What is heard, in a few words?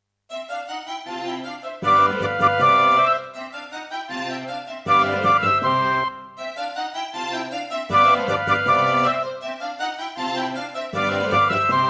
Roland SC-88; classical; Mozart; Concerto K467 partial